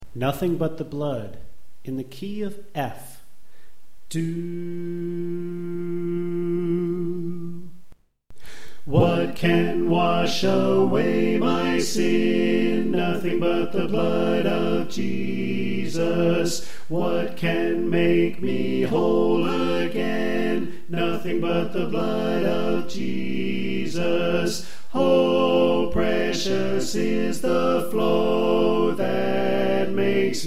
Tenor Left

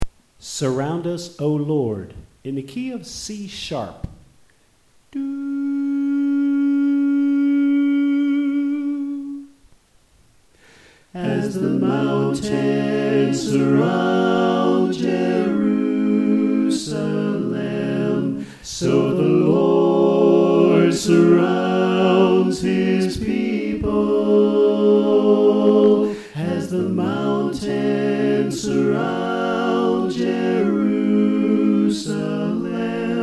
Equal Mix

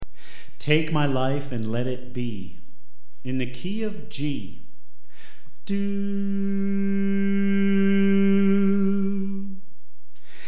Soprano

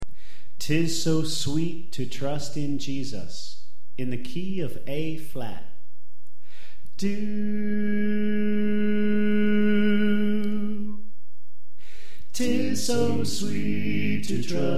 Alto Left

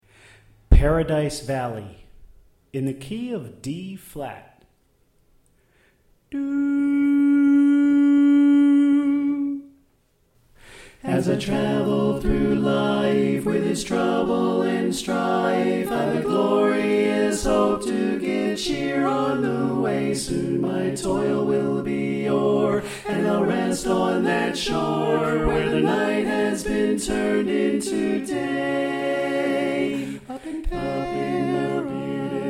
Tenor Left

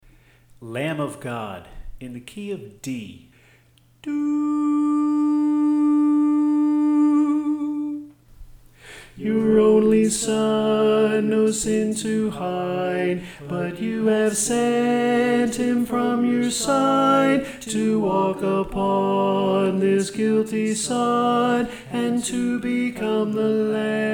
Tenor

